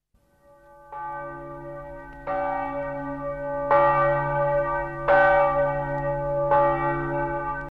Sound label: bells dong